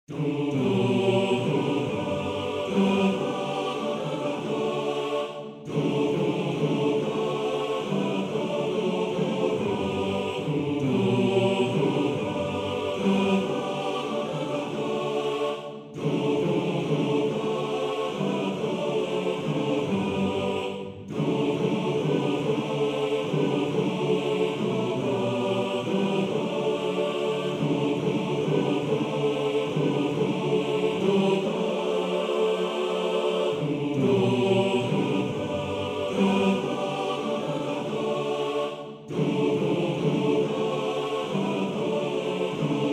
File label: Chor, maennerchor; Schifferlied